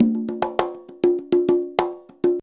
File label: CONGA, Modern Percussion Loops; Soundscan vol.56; AKAI; CONGA 10G